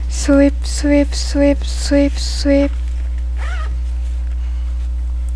sweep

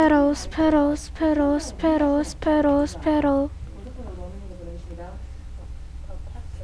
sparrow

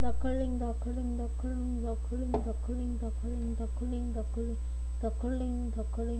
duckling

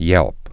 yelp

